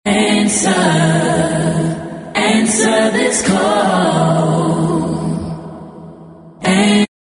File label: the call a capella